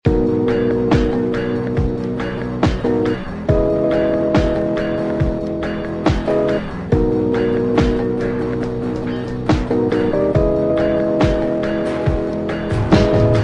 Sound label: ringtone